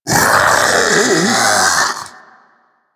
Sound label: Infested Splitter Split Vocal Death; Warframe; Grey Strain